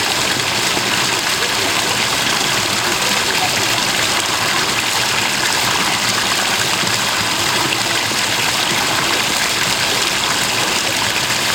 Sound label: water